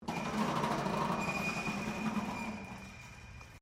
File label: METAL BIN SCRAPE WIRE FENCE; Materials